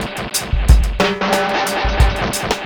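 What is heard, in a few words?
reggae drum FX; Best Service Advanced Media Trax Modern Composer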